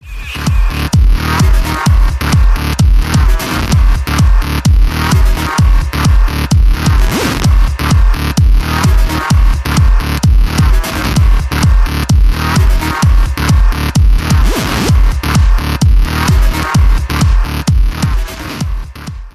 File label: The Best Bass